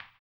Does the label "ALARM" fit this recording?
No